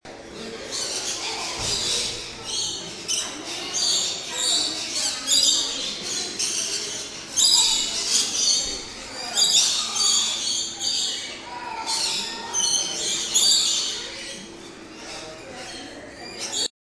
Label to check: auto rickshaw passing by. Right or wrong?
Wrong